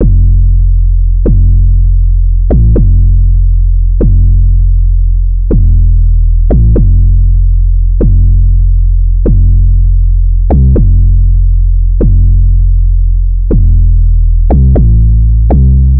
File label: 808 loop 120 F; Slate Digital BASSHOLE Sample Pack; 808 Loops